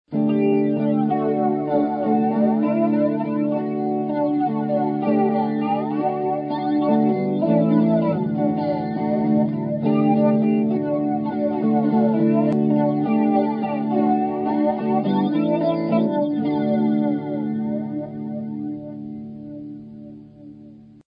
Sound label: flanger